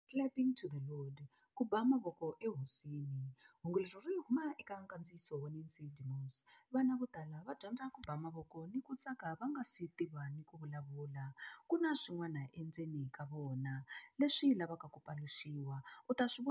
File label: clapping; clapping to the lord